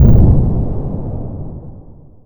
depth charge

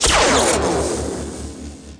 more lightning fast, weapon

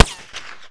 spike hit

